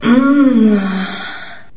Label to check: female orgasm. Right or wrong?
Right